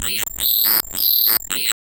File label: in the game the spider, spider